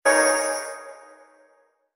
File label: summon appear